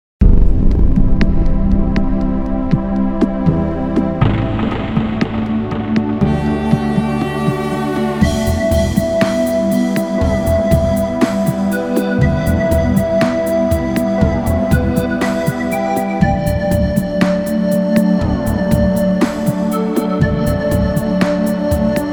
april ambience

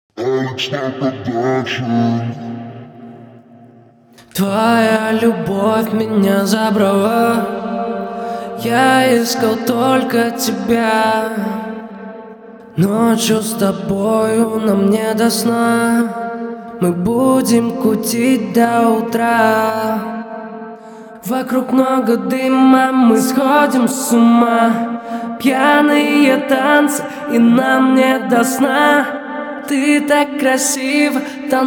Acapella

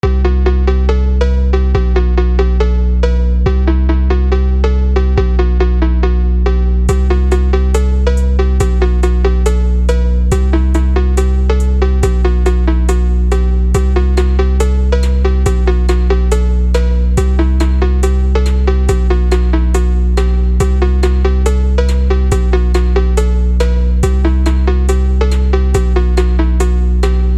Phonk Фонк

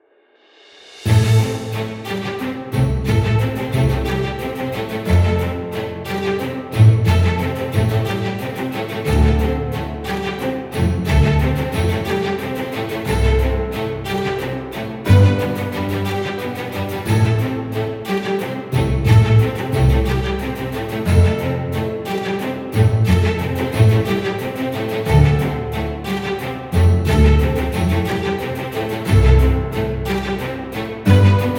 Background Music

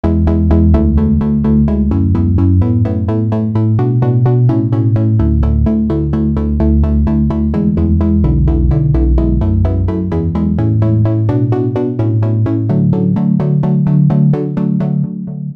Bass line

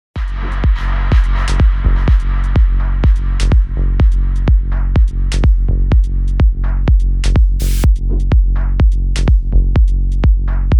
Plastic noise

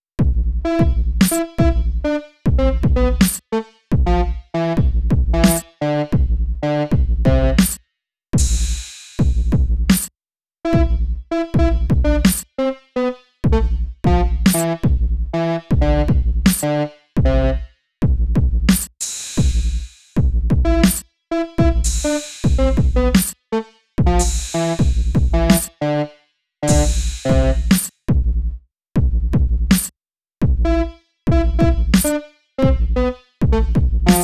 Beat

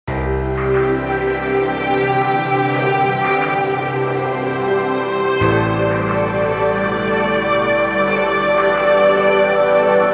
Dream Ambient Relax Music

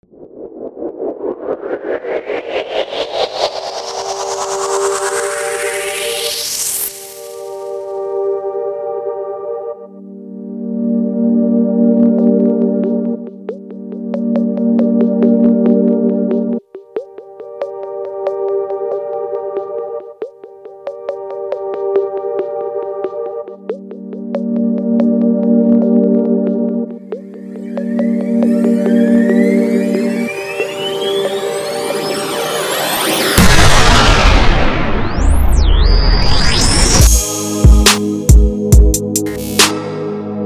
Chillstep

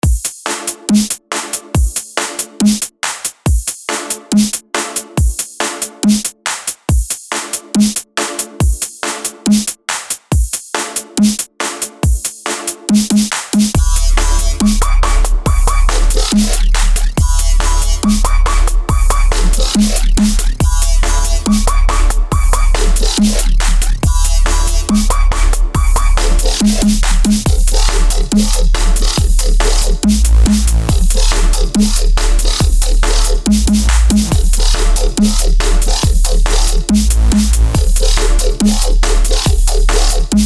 Dubstep

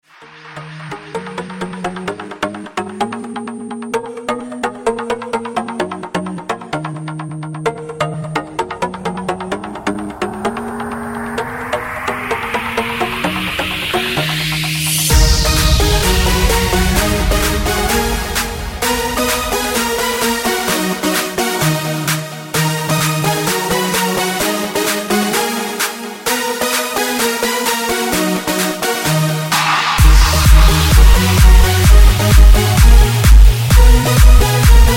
Dutch House

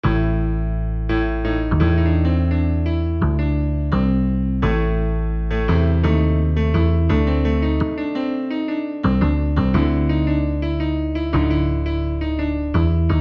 blues

